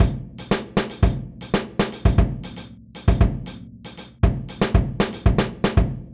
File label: Groove 117bpm; GroovePizza